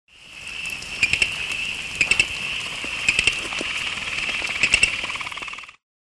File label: Boophis xerophilus